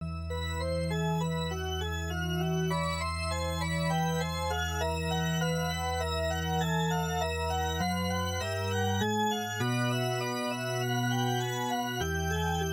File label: Organ Fanfare; Mode32 5